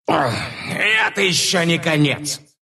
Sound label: death ru